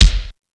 BD3 M1, DRUMS; East West; Global Sounds

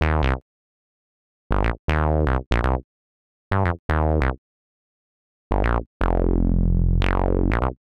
buggy bass C; PowerFX - Nu Jazz House

